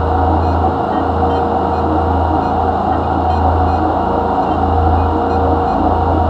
probe idle; Stellaris; other entities

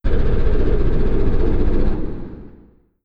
distance auto cannon fire medium; weapons; Stellaris

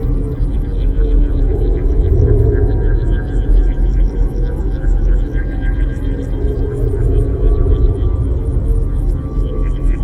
ringworld hologram construction ambient; Stellaris; utopia

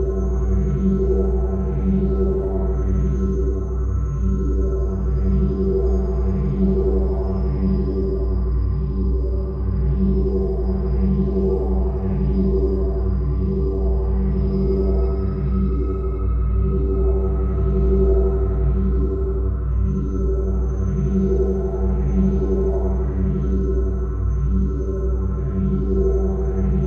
synthqueen ship small ship idle; Stellaris; machineage

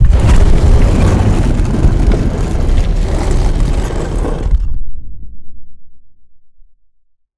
archeology event big door opening; ancient relics

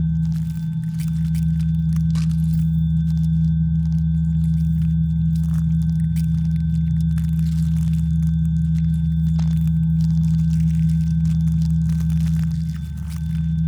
pro ship science idle; biogenesis

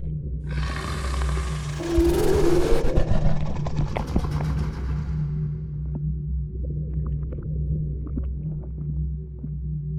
aqudragon reanimated idle; Stellaris

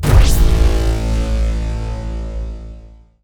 planet killer fire; apocalypse

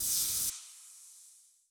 mega shipyard electricity amb

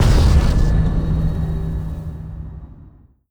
ion cannon hit; apocalypse

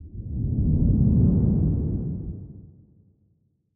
kaiju adult tail swish long low; biogenesis

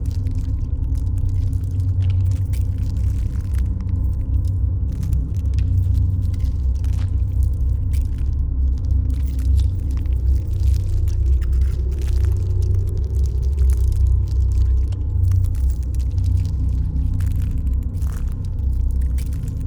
pro ship mining station idle; biogenesis

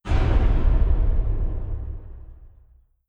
distance railgun hit, weapons; Stellaris

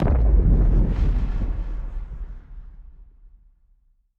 ship move; beholder; Stellaris; paragon; ships

